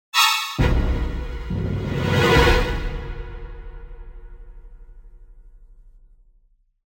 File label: terrified